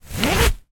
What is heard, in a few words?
foley makeup pouch fabric zipper open or close